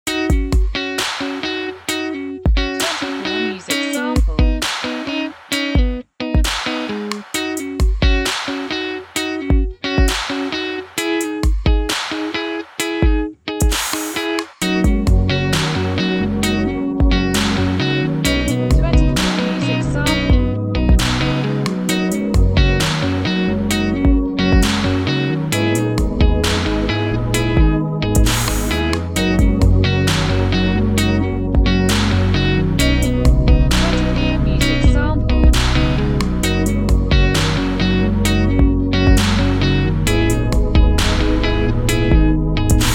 primal lounge

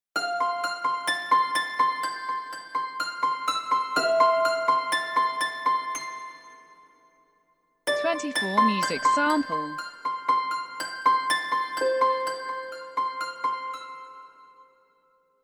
harp

